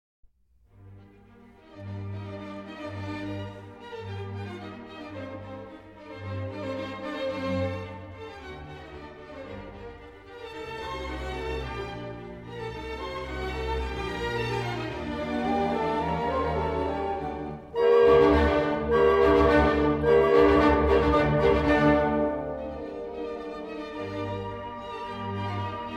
Symphony No. 40 in G Minor, K. 550 I. Molto allegro; Mozart, Wolfgang Amadeus